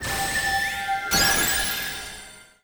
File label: Starbox Open Normal